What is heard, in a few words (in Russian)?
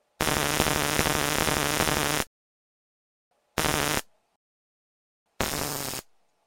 razrjad shokera